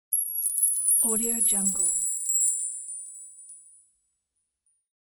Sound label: sparkling chime transition